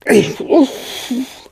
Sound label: launch pain; elivance